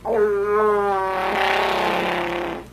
fart; chewbacca

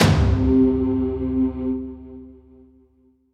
sting

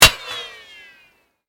metal

